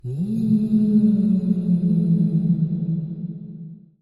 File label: ghost